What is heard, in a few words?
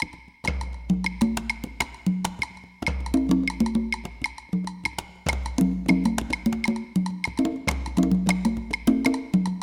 rumba guaguanco